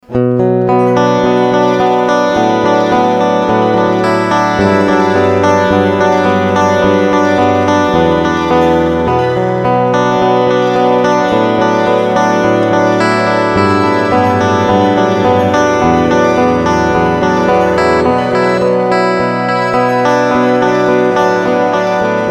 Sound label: Delay Pur